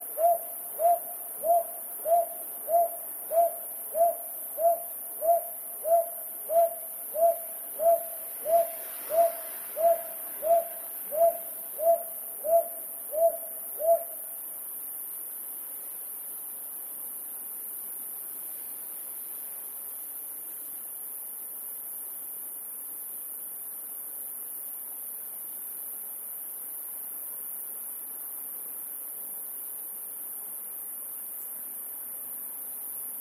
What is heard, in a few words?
brown hawk owl